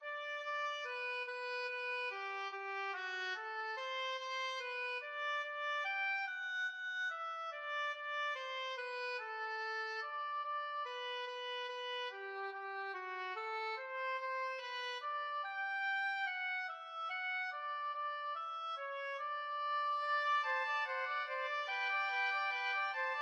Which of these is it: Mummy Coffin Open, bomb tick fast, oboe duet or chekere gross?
oboe duet